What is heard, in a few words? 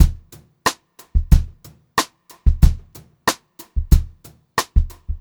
Funk-Groove Drumloops; USB Soundscan vol.38; AKAI; 90 s Sample CDs